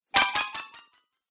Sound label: Rpg App Game Coin Bonus, Magic Sound Effects